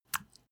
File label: step barefoot wet light; schritte